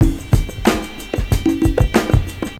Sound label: Beat; AMG